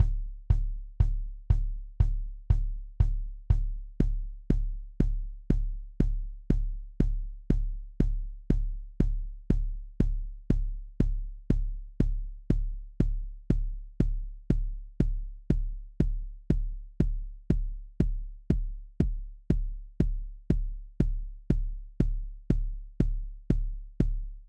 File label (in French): Son d'un Kick avec et sans Pitch Enveloppe